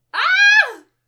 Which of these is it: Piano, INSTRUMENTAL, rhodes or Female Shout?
Female Shout